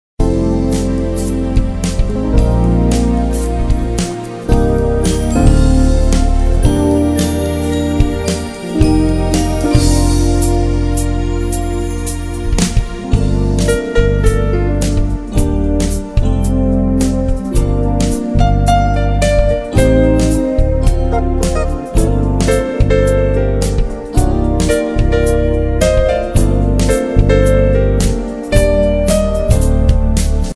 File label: easy listening